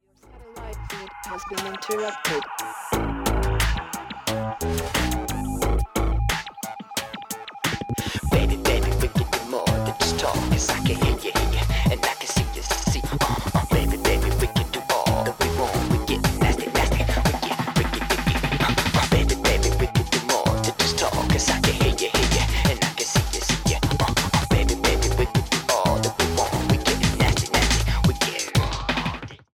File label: bomb planted; music kits custom